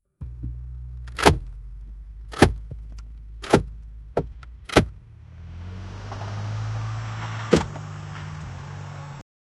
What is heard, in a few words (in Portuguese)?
som de derrapagem e carro, collisions